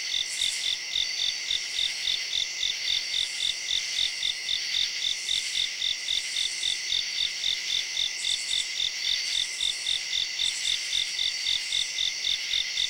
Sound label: summer crickets and frogs